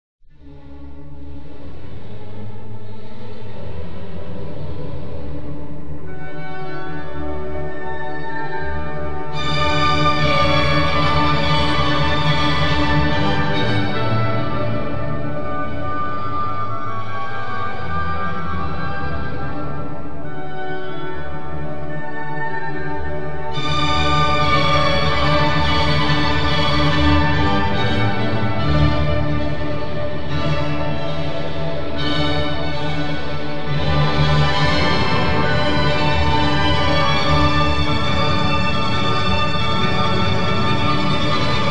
orchestral cathedral; reverb demo

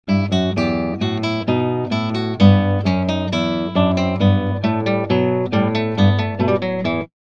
guitar anechoic; reverb demo

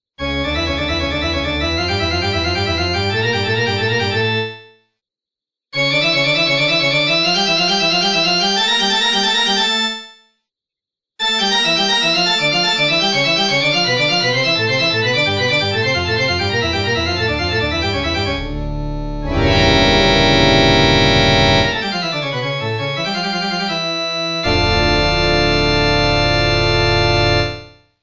organ anechoic; reverb demo

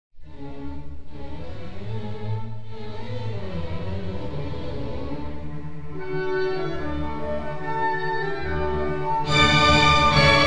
orchestral concert; reverb demo

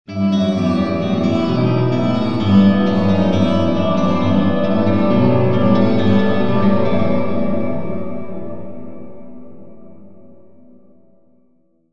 guitar cathedral; reverb demo

